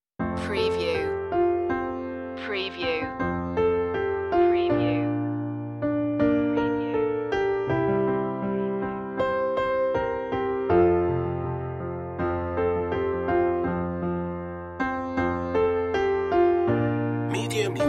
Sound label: jingle bells piano 80bpm